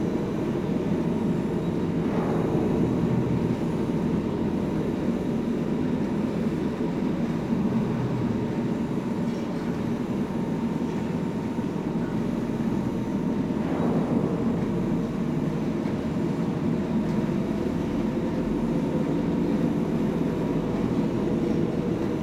Galleon Small Room Amb; Warframe; Ambience; Grineer Galleon

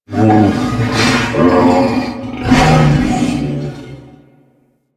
Wolf Pet; Animals; Warframe; Quests; Duviri

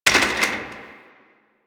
office chair impact; Warframe; Nineteen Ninety Nine

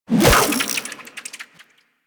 Fishing Spear Line Retract; Warframe; Venus Fishing Spears

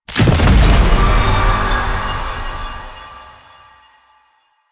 Beacon Pickup; Warframe; Jade Shadows; Ascension

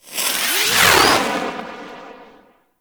Swarm Missile Projectile; Enemies; Warframe; Eidolon; Jetpack Marine

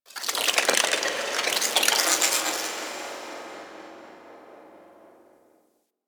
Cephalon Tech Small, Ambience

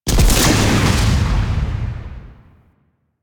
Archwing Scythe shot; Melee; Warframe; Weapons; Exalted Archwing Scythe

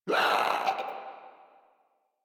Infested Runner Hear Sound; Warframe; Runners

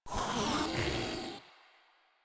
Infested Ancient Vocal Pain; Warframe; Proto Infested Ancient

